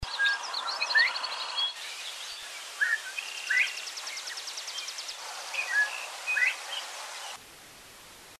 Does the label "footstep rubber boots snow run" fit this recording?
No